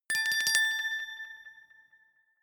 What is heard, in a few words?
glockenspiel sparkle tremolo low octave short